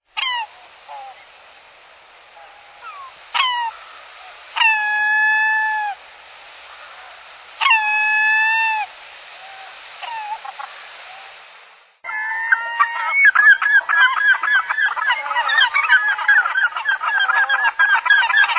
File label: California Gull; Birds